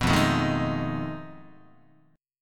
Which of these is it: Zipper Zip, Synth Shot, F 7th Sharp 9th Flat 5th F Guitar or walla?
F 7th Sharp 9th Flat 5th F Guitar